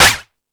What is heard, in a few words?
Percussion FX, E-MU Pro-Cussion; E-MU Pro-Cussion-1